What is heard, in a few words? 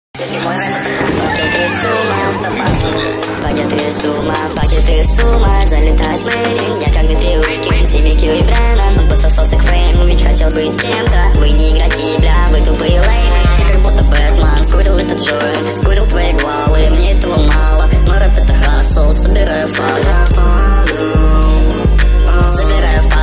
nightcore reverb